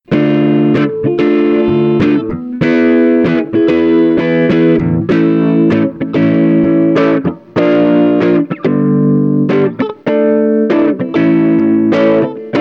guitarra

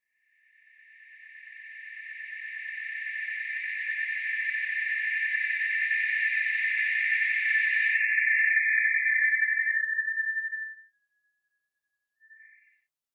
Loops mutantes

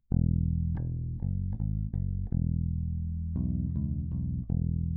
bajo

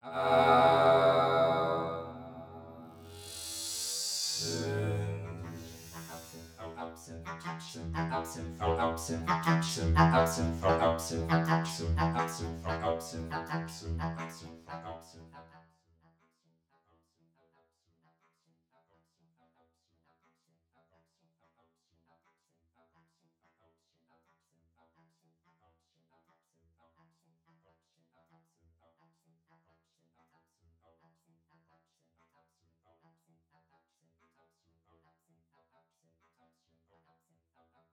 Loops mutantes

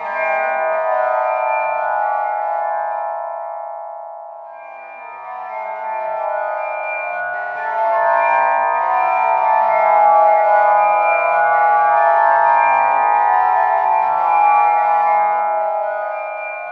loop procesado freeze reson

